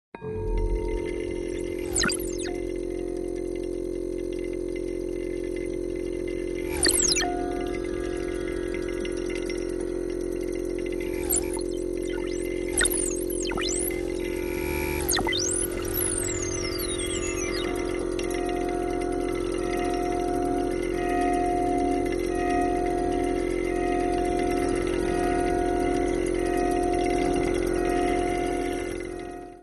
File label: Cracked Voices, Electronic Music